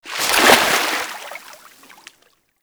Generic Roll Water, Generic Foley; Warframe; Characters

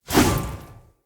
Sphere Polearm Throw, Weapons; Player

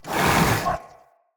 Corrupted Battle Dog Breath Fill, Animals, Vocals

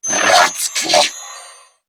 Surprised Female; New War; Narmer Grineer

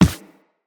Operator Run Default, Footsteps; Characters

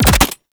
Concrete Archgun Mech; Warframe; Archwing

